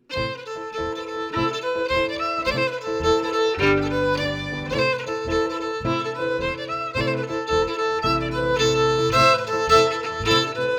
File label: FIDDLE PIANO; Jink & Diddle Book o' Tunes 2022